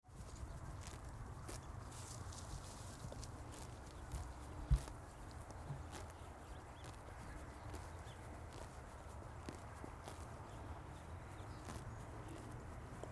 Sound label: Horse Eating Grass; Debarup Travel & Films